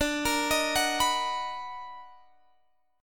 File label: B Major 7th D; Bmaj7/D arpeggiated; JGuitar